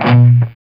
C 4, Soldano rock guitar; Spectrasonics; Hans Zimmer Guitars VOL-1

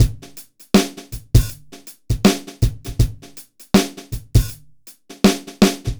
BPM 80 AMB

